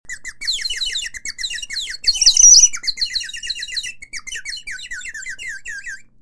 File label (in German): Zwitschervogel